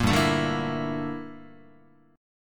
G Minor 6th Add 9th G down Guitar; Gm6add9 strummed; JGuitar

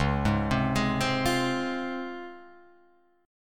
Db Major 7th Db down Guitar Open F; arpeggiated

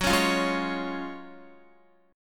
Gb Major 7th Suspended 2nd, Guitar; GbM7sus2 strummed; JGuitar

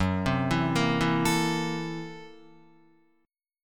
Gb 7th Sharp 9th Flat 5th Gb Guitar; arpeggiated; Gb7#9b5; JGuitar

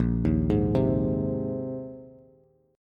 Bb 7th Suspended 2nd Suspended 4th Bb, Bass; Bb7sus2sus4; arpeggiated; 6,6,6,5; JGuitar

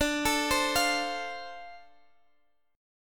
D-Minor 7th D Guitar Open D, chord

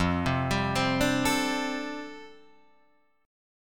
F 6th Add 9th F down Guitar Drop D, chord

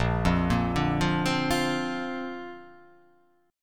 A sharp Major Add 9th

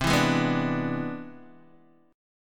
C Augmented Major 9th, Guitar, DADGAD

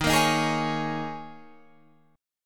D sharp 7th Suspended 4th, Guitar, Open F; D#7sus4; strummed

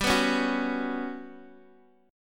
G Major 7th Flat 5th, Guitar, Open G; x,x,5,4,2,4; down

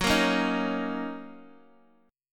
Gb 6th Gb down Guitar Open D

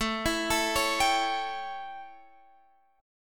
A 7th Guitar Drop D, chord

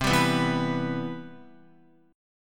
C Major 7th, Guitar

